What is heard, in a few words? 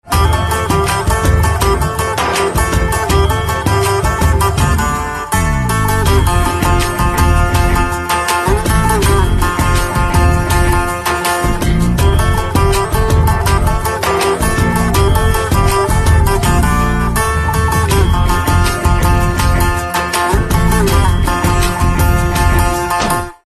Sitar Instrumental Ringtone; sitar and veena